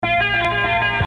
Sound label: Guitar; D n B